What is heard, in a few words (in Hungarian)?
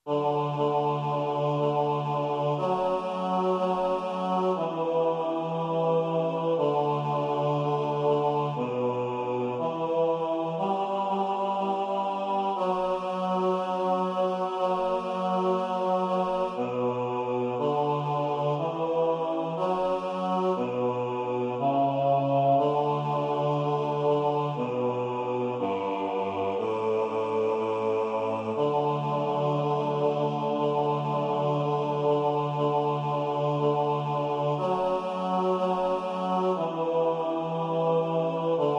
basszus, Atyám a Te kezedbe